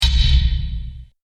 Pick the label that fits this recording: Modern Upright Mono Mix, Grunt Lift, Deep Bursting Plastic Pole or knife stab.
Deep Bursting Plastic Pole